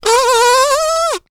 Cartoon Squeak

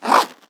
Zipper Zip

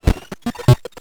Beepy Glitch

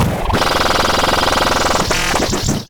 Mechanical Malfunction Rumble

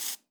Spray Hiss

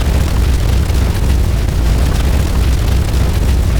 Loopable Mechanical Rumble

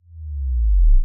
Clean Bass Bend

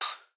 synthetic puff

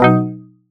strum reward

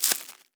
Wet Rustle

